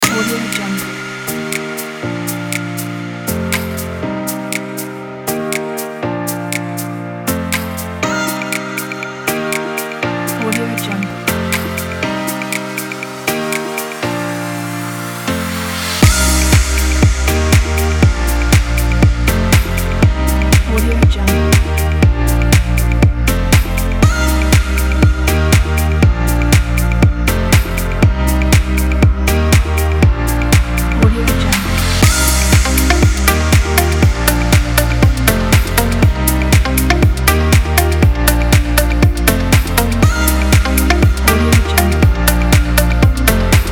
fashion house

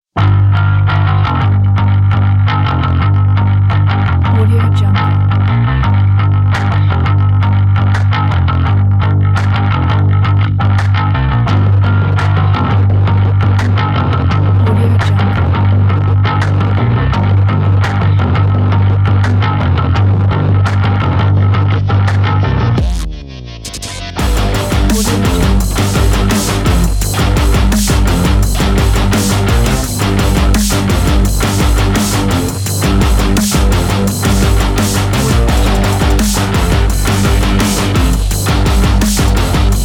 energetic sport trailer

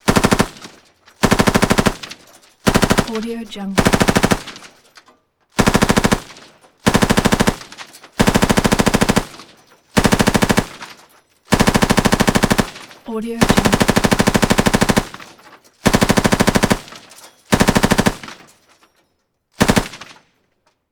gun combo pov; mac 58

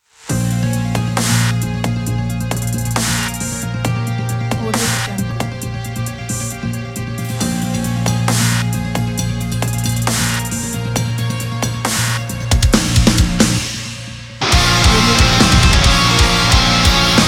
progressive metal

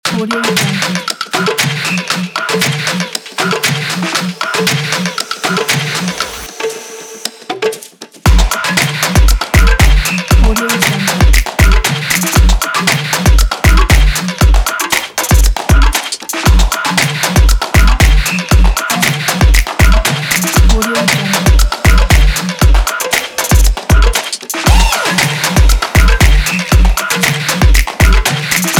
fashion percussion

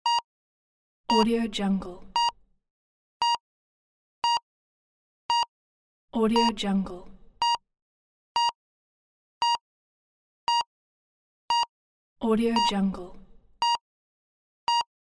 heart monitor beep

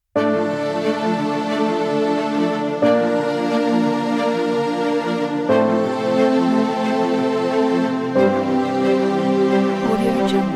epic inspiring

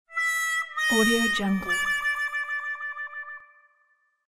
funny jingle

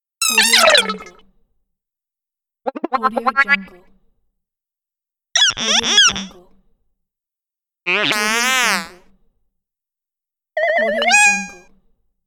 wacky fx

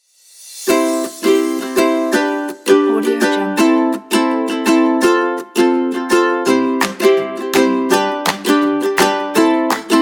happy

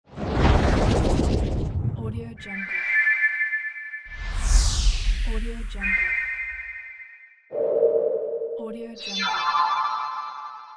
air magic

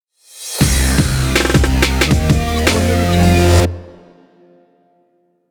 agressive logo; kudlaproduction

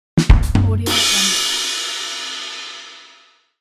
joke drum fill

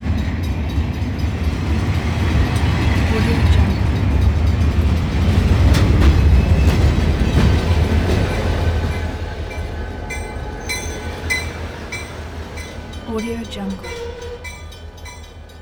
train scrape by constant crossing bell away

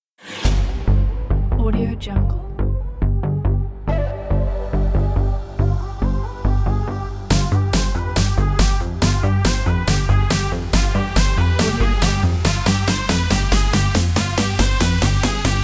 aggressive background sport event

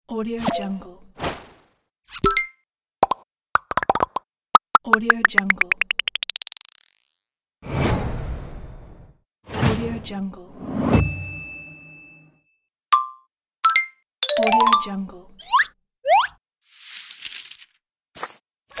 infographics video sound pack

